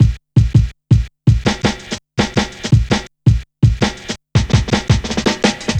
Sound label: Check A, Jungle, Drum Loops 165.5; Zero-G Jungle Warfare 1, 2 & 3